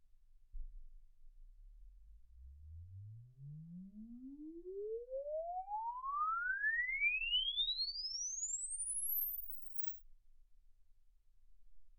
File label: exp swept sinus 10Hz 24kHz